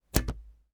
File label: Tape on Glass; Footsteps; Haunted Bloodlines; SFX Producer